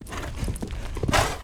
unicorn loop; Politburo

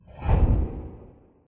woosh; Politburo

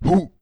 knight surprise; Politburo

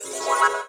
hat on; Politburo

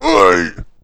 knight cheer; Politburo

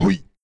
knight surprise; Politburo

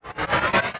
speedup; Politburo

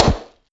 balloon explosion; Politburo

